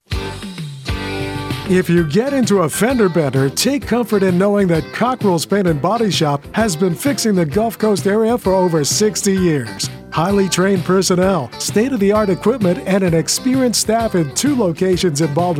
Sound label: Jingle; Cockrells Body